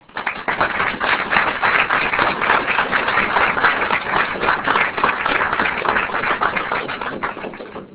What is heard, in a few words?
taps